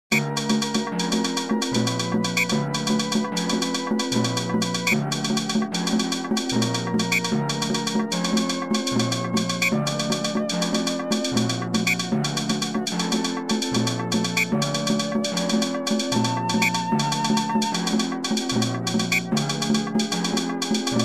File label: rhythm; Another 13 beat rhythm, this time on vibraphones and castanets.